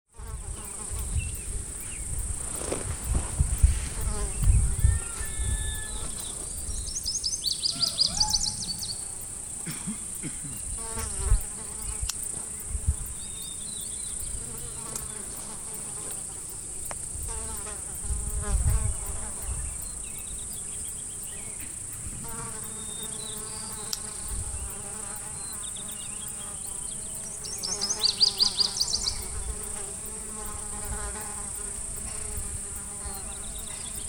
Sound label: flies crickets and birds; Montana 2013